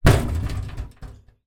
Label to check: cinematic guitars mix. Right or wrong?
Wrong